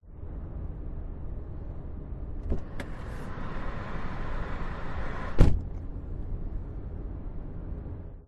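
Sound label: car door open close while driving interior pov; vehicles; zapsplat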